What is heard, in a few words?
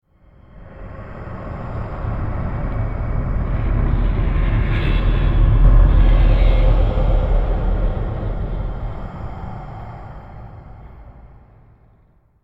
Stinger Swell Long